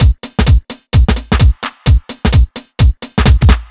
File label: Full, Drum Loops 129bpm; Zero-G; Soundclash Nu Dance Electro; Acidized Wavs; Typewriter